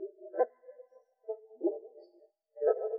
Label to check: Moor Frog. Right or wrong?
Right